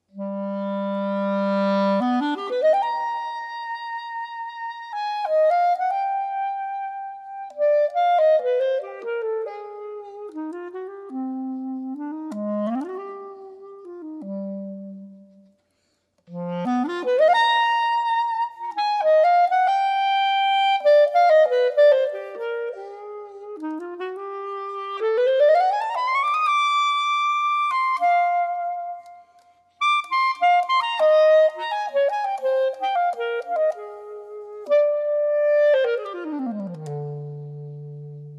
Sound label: solo jazz clarinet; 3 pieces for solo jazz clarinet